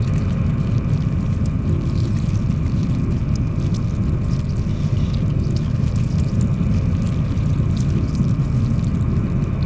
ant ship strikecraft idle; Stellaris; biogenesis

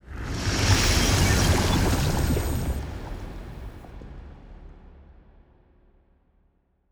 ship science ship move; Stellaris; toxoids

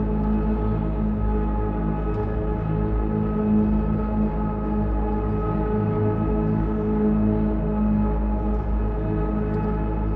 ship colony ship idle; Stellaris; shroud; ships

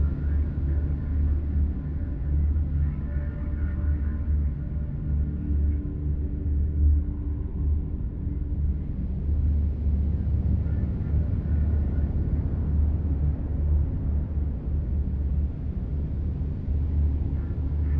ship military defense station idle; Stellaris; aquatics; ships

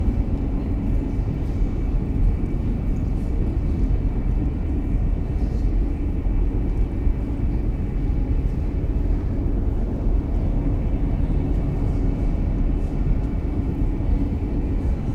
grand archive idle; Stellaris; ships

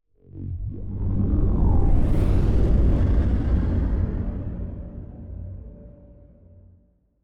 ship colony ship move; Stellaris; shroud; ships

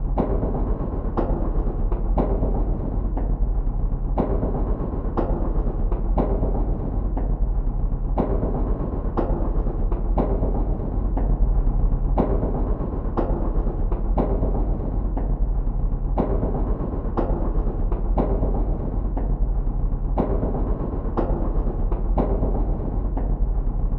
matter decompressor conveyor; Stellaris; megacorp

